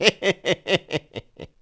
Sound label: "Heheheheh"; Senza (pirate); Zelda